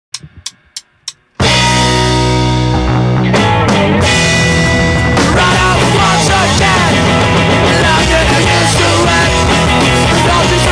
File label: Electric Guitar